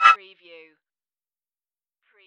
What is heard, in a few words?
hover